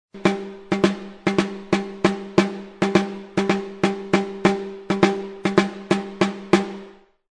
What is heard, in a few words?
ttunttun peau; instrument